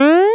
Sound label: Jump Boing